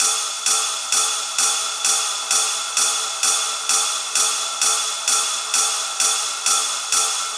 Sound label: Ride Drop, 130